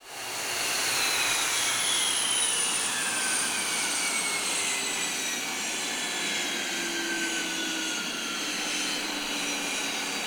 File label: Aircrafts F-5E J85GE Shutdown; DCS